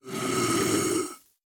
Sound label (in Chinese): 待机声; 尸壳